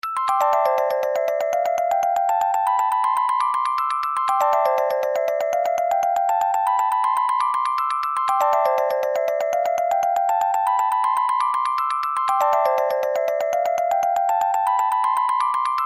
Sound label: Music Box